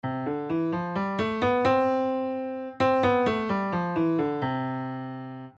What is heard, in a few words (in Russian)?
до мажор